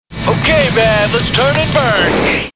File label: turn burn